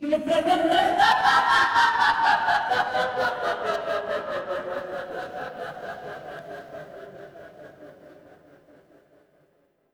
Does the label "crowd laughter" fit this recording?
Yes